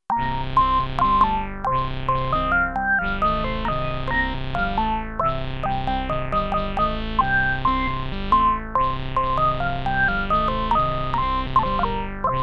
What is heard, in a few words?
LabVIEW Synth